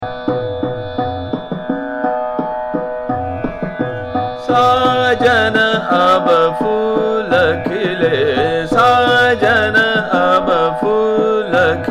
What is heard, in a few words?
Chota Khyal, ektal Drut; Sajan Ab phool Khile; Bahaar; tanarang